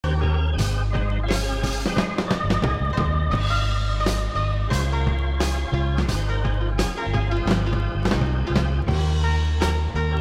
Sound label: Gospel Music